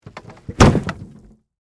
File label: Impact Wood Object, Fall, Over, Heavy; Materials